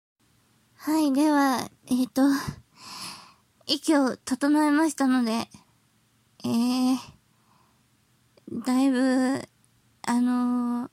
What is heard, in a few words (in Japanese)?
オホ声 after